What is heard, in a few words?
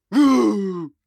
Attack; Hollow Knight; False Knight